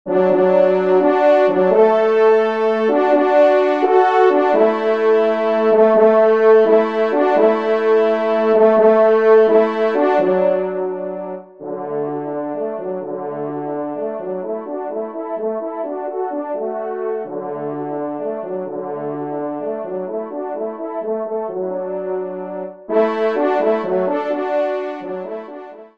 trompe; Reverie; 2e Trompe

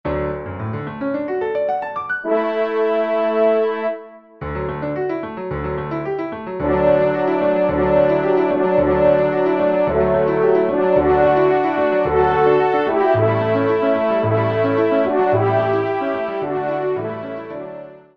Trp et Pia; Introit; Messe d'Estival

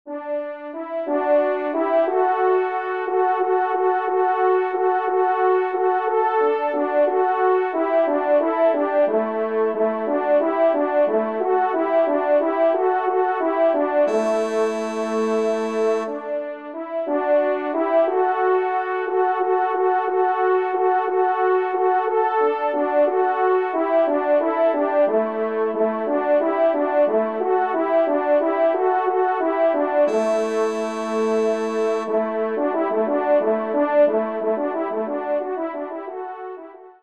Caprice Do Maj; Corrette

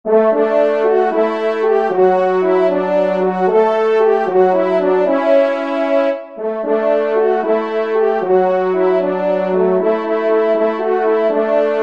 TRP

